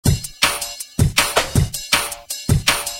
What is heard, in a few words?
break